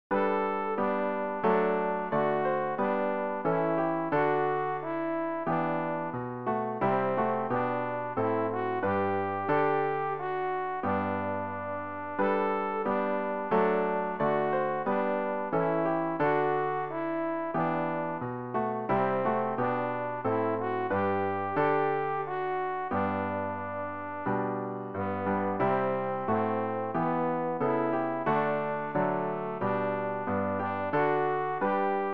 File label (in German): alt, Übehilfen für das Erlernen von Liedern; rg 159 liebster jesu wir sind hier dich und dein wort